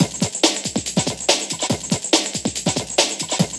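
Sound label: drum loop; X-Static Goldmine 3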